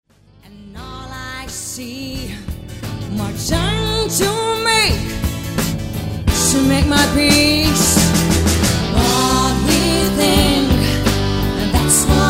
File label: magical sparcs